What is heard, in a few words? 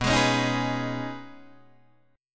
B 6th Add 9th B Guitar Open D, chord